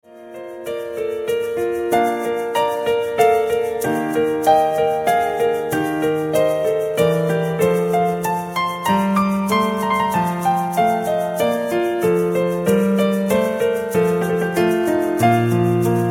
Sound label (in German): Siciliano aus Sonate in Eb-Dur